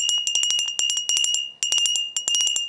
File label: bell